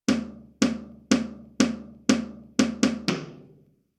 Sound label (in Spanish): Ritmo